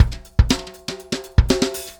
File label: Acoustic Drumloop